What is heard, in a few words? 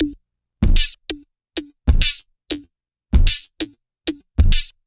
Structured Rhythm, IDM Rhythms